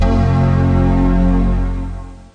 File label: boot; strawberry